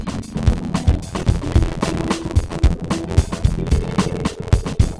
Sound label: Latino